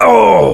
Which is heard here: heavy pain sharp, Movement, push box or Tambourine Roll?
heavy pain sharp